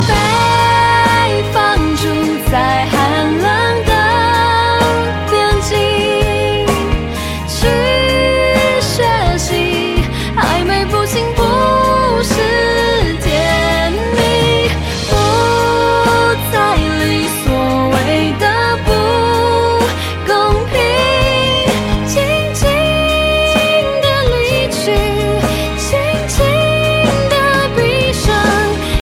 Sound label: chinese song ringtone